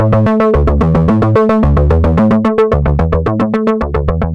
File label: philter (seq)